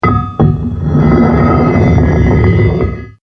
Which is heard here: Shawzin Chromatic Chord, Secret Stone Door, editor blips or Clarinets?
Secret Stone Door